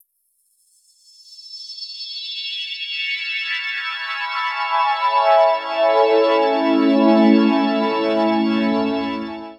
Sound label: Filtered, Organ, Loops, Effected; Sonic Foundry (Sony Creative Software); Joe Vitale Organ Donor